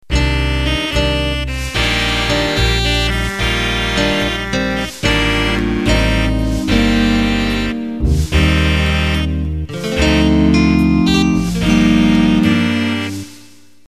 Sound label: Slow Jazz Intro, MIDI